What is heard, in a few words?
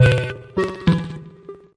136 triplet synth love; SYNTH AND ELECTRONIC LOOPS